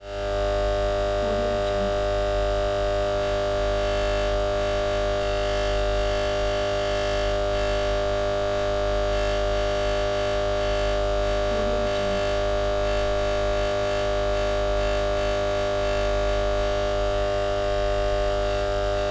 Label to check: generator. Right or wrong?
Right